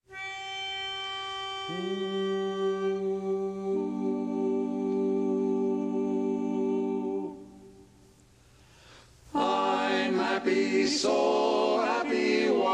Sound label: bass